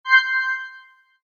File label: Pickup: Generic Pickup SFX For Collection Of Item, Video Game; Games